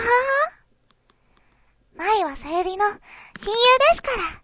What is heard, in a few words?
ahaha